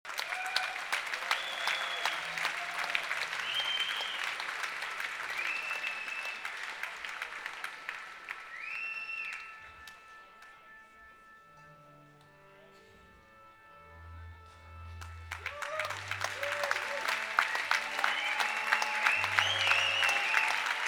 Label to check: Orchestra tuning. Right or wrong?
Right